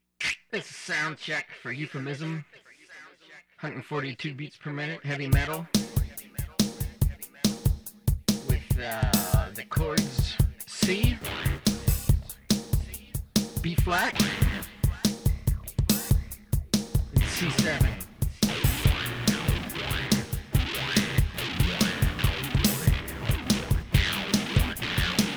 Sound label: Soundcheck